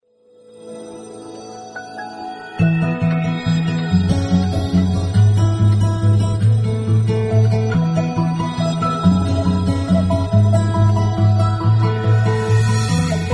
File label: bg music